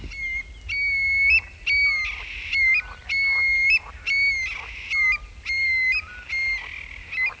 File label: call, Stone curlew, In the background of the audio sample: frogs and Scops owl calls.; This call was uttered one hour before dawn; Burhinus oedicnemus